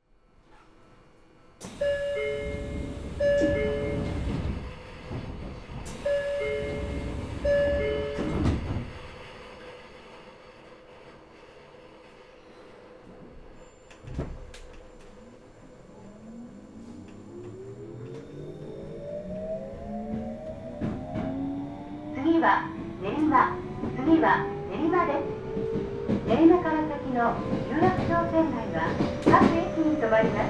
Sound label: Tokyo metro 07